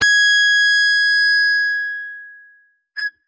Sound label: guitar electronic, distortion guitar